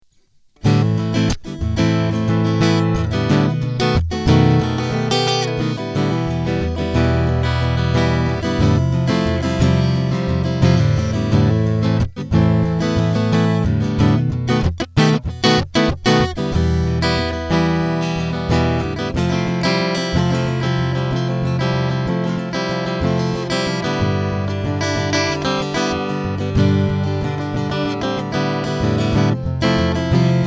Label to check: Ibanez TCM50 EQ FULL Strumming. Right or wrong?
Right